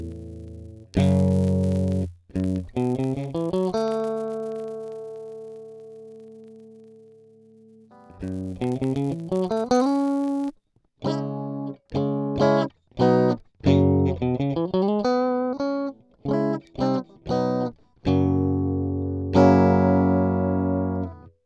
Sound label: kemper crackle